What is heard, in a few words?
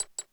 Spinning Platform; New Super Mario Bros. Wii